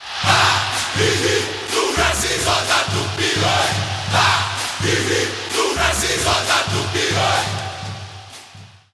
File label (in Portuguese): VINHETA